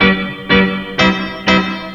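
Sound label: PIANO loop; Ragga & Dub Reggae; PIANOS; AKAI; USB Soundscan vol.27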